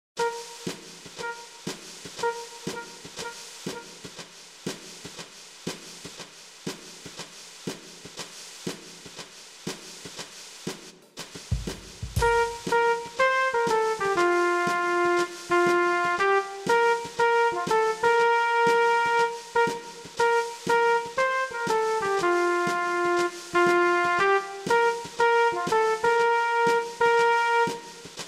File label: Ding Dong Merrily Trumpet; Weihnachtslieder; Playbacks